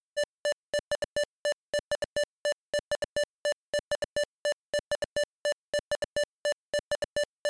ringtone

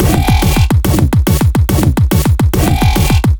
break tweaking

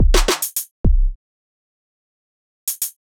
break tweaking

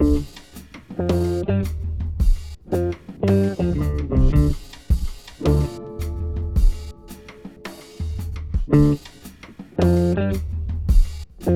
promo video background music twin peaks style; Misterio

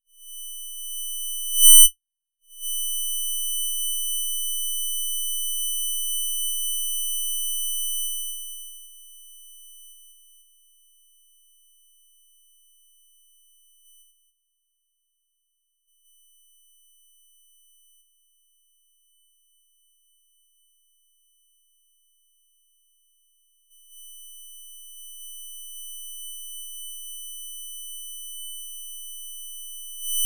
vibrato to a distorting amp

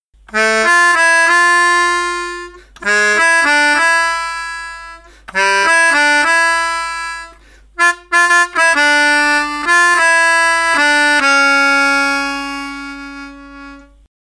melodica

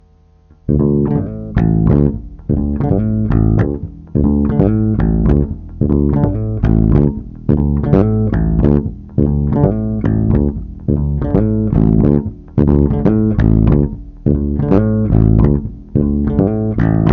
bass guitar

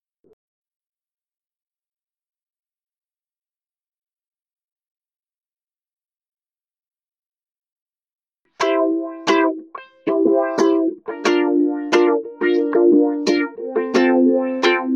ukelele

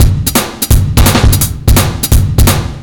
solo drums

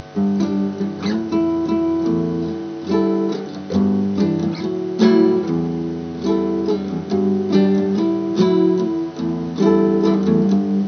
guitar

